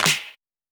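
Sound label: clap with low 808 snare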